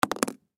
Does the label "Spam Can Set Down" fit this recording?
Yes